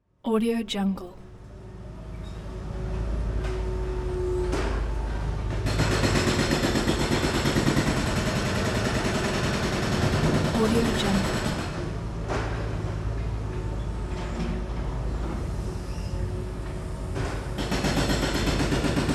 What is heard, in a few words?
construction site